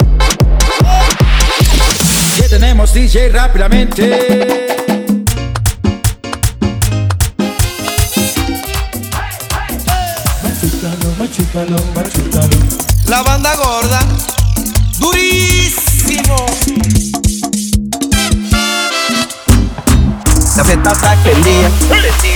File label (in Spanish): Merengue Paquete extendido